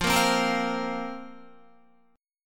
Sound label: F Suspended 4th, Guitar; strummed; chordshape; 12,13,12,15,12,13